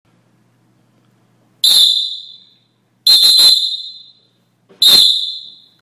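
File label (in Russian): судейский свисток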